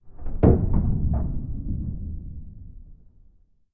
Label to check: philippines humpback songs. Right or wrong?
Wrong